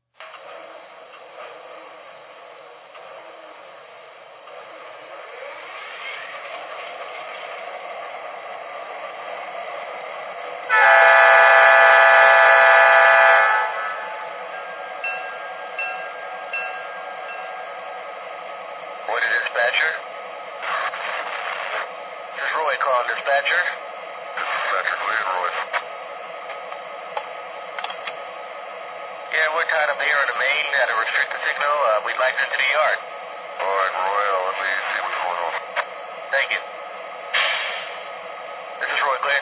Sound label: gp38 2, trains